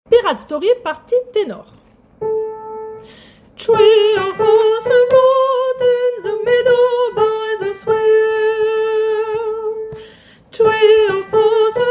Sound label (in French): Tenor